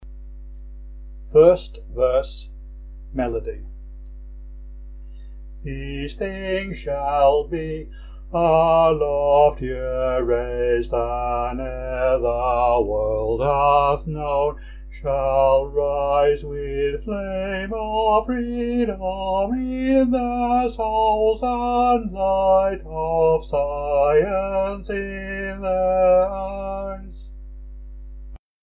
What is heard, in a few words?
These Things Shall Be sung verse 1 melody, hymns